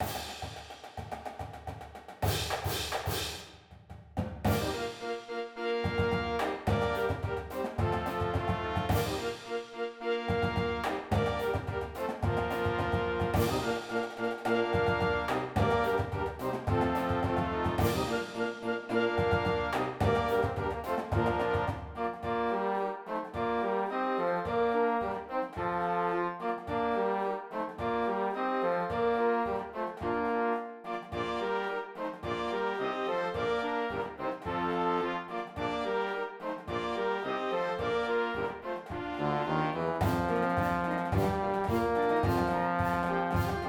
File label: la morisque with drums; robin hood festival parade band